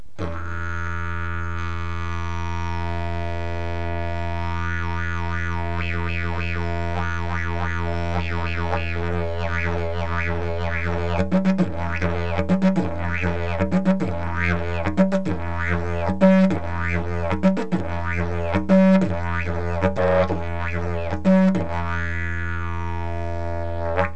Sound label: didgeridoo; jilm sukaty